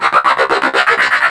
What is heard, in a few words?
fall; alienarena; martianenforcer